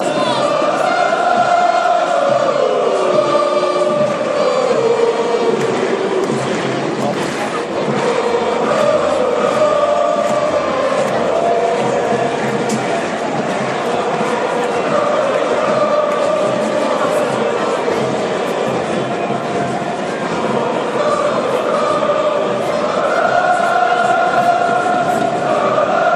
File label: loooolalalalala, fanchants